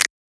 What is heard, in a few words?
Perc; Sample Banks; Percussion